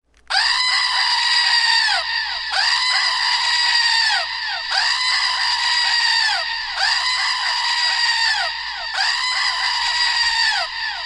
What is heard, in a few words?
Screaming Goat